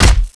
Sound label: Hit, Infected Armored; S-CORE UMSW